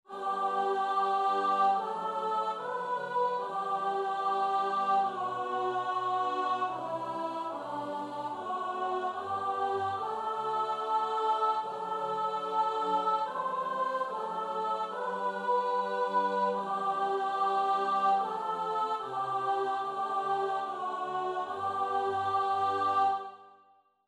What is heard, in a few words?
Psalm soprano